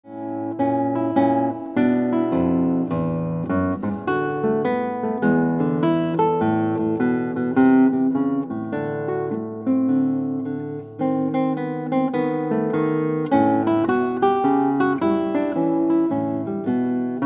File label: Guitar